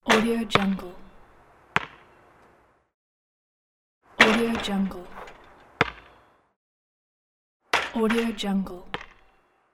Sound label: basketball